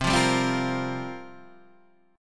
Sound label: C 7th Suspended 4th, Guitar, Standard; 8,8,5,5,6,6; C7sus4 strummed; capo=3